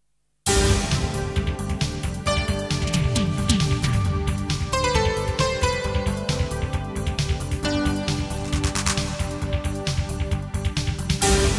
jingles; 90 DDD Jingles

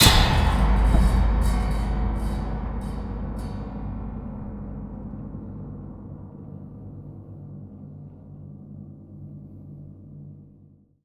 abrasive lane, Cinematic Metal Impacts

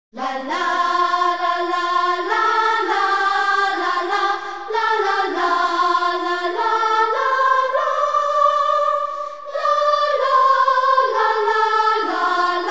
Christmas Jingles; Koka Media Productions; More Christmas Jingles

